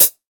dry foot cymbal g key, Royality free pedal hi-hat sample tuned to the G note. Loudest frequency: 10911